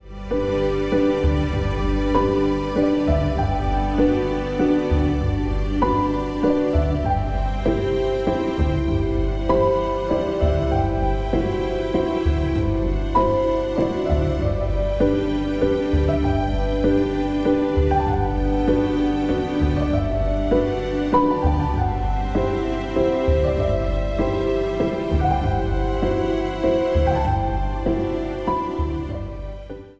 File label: Welcome Dear Orquestral